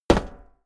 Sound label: step walk metal hollow